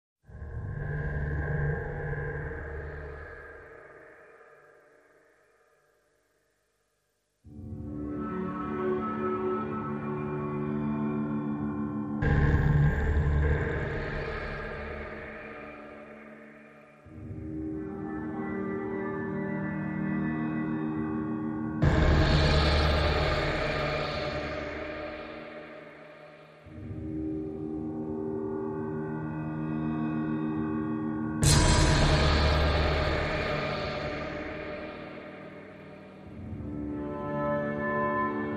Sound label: FX Drones Only